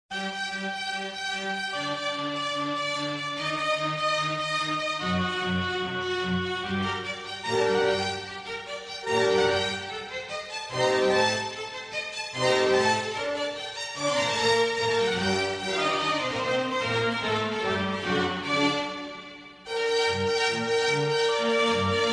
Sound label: Easy Listening & Classical; Sound Ideas